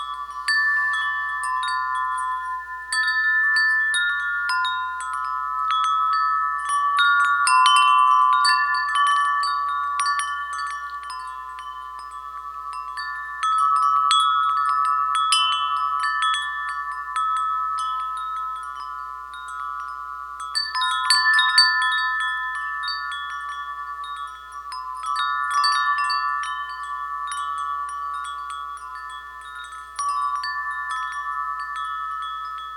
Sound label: small chime